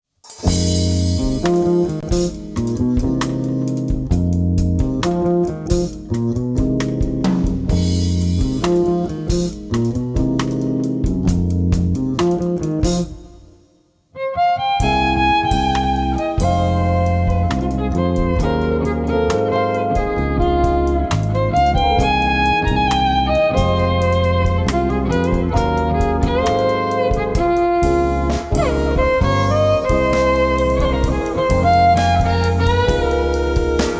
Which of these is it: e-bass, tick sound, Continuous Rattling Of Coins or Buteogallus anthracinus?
e-bass